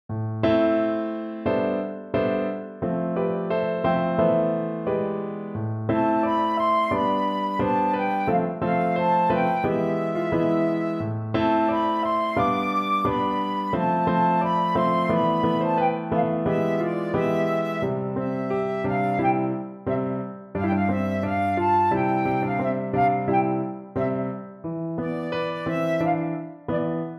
Molto moderato descant, recorder

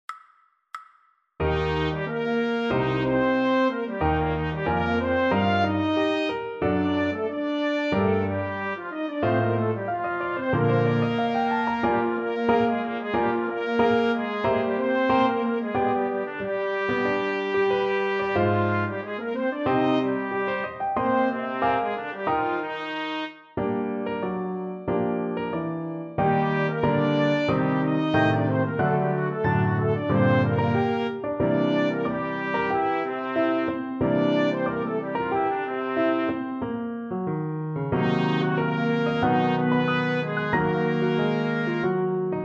trumpet

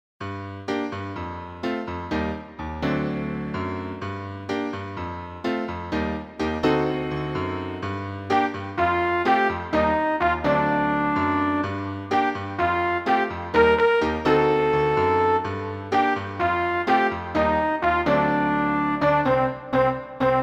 trumpet

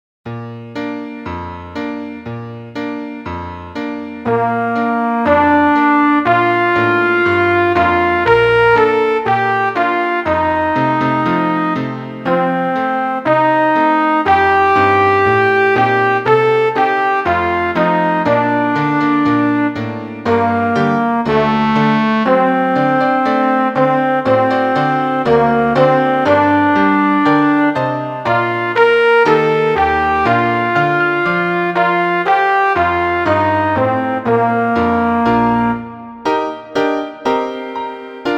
trumpet

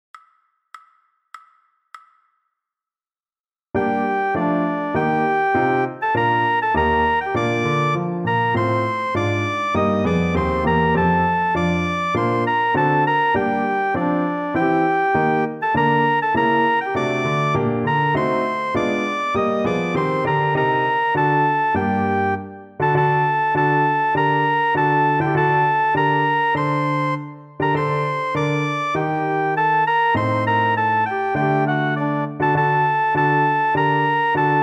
trumpet

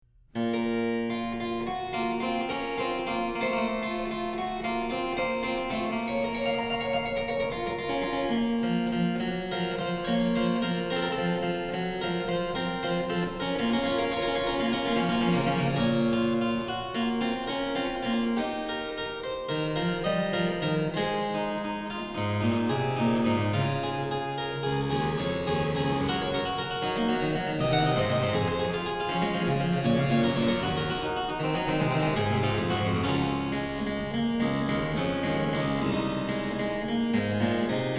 Harpsichord; Bach; Sinfonia No. 15; BWV 801

